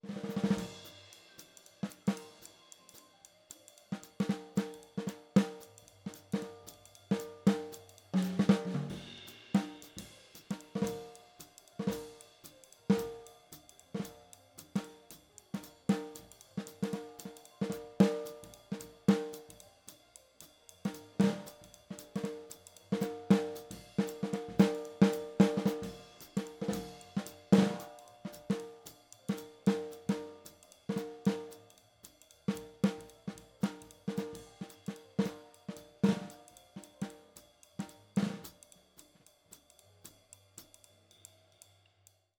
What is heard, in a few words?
Snare